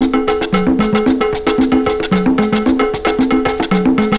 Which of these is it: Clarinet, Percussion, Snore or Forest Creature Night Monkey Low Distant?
Percussion